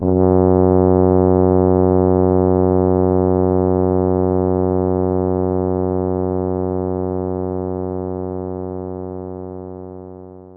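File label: Tuba F sharp 1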